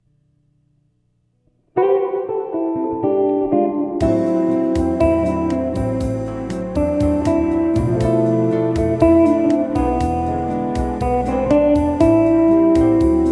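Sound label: gentle rain, guitar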